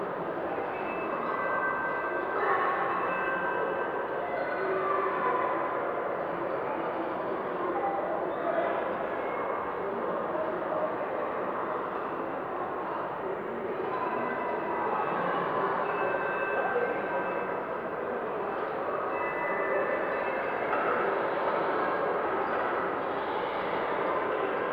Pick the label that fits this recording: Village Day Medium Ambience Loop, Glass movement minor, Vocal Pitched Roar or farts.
Village Day Medium Ambience Loop